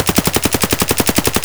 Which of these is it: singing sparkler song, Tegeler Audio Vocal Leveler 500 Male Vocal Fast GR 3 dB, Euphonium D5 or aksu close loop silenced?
aksu close loop silenced